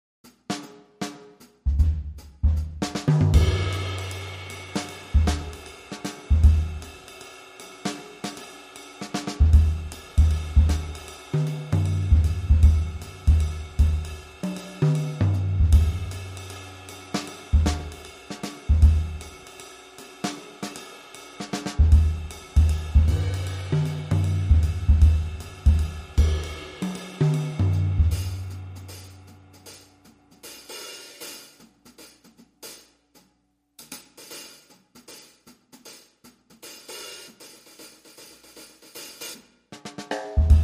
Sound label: Jazzy and Cool